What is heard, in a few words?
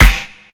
hq hot steel snare drum sample f sharp key